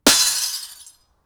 sledgehammer smash

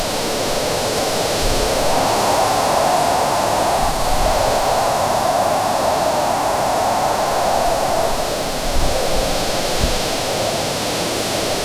a strong gust of wind blowing through trees

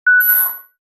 crisp minimalistic futuri

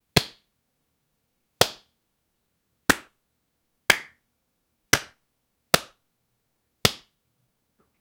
playful slap on the back in jest

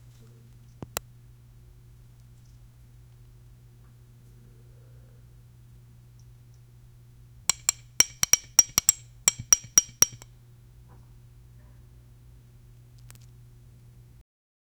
someone scaling a fish

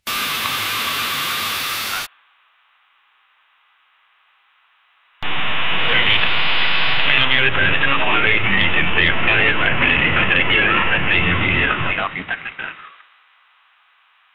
radio broadcasting intro

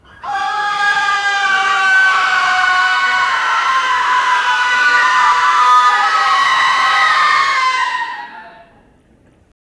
crowd screaming in kitche

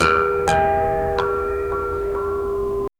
wronge sound harp sound

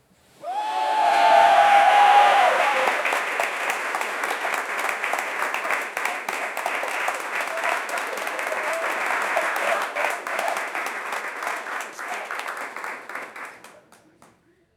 crowd clapping

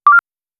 menu button sound; zelda

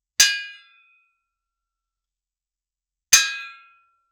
the sharp clang of a metal pipe being dropped

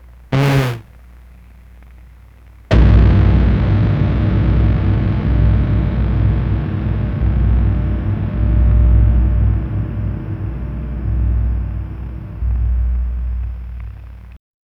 futuristic cannon deep

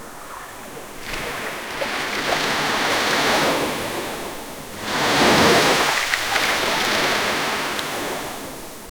gentle waves lapping at a sandy shore

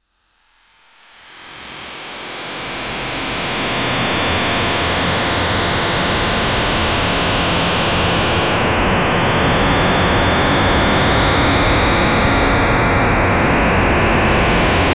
fast sound of pixels

